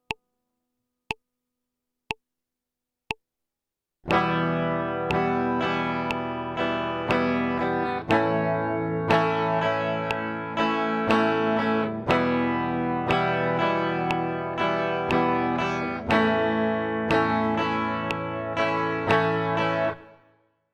intro strumming em a, guitar

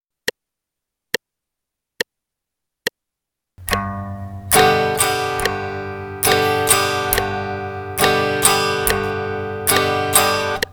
G bass note, guitar

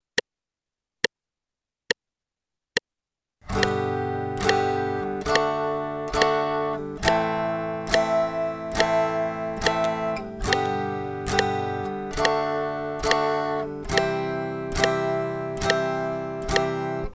strumming downstrokes 1 2 3 4, guitar

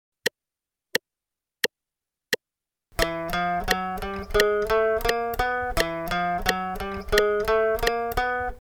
Example eighth notes, guitar

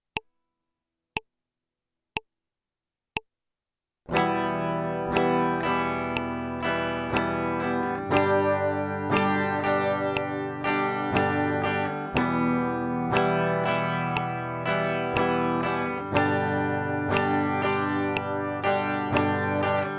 intro strumming full version, guitar

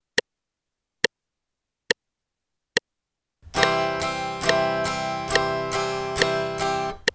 Upstrokes downstrokes C, guitar

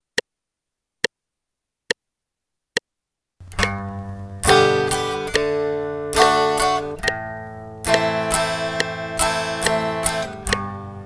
song bass note, guitar

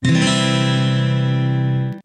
c maj x32010, guitar chord

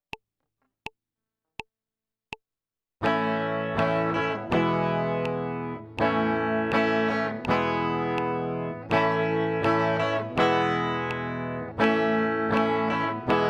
chord change a e, guitar

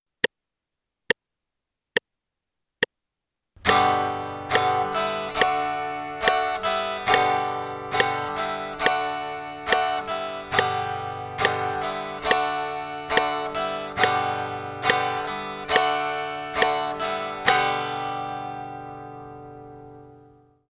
G D With Open strum, guitar

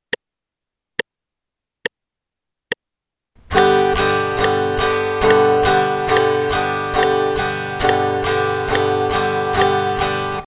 G strum in eighth notes, guitar

